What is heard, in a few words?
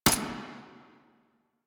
Grineer Assault Rifle Mech; Weapons; Warframe